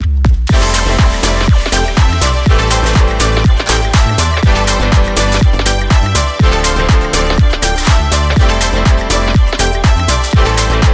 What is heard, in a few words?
energetic background upbeat